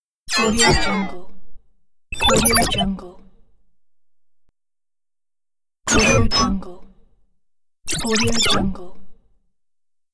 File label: scifi electronics; livingroomclassic